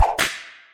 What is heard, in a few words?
whip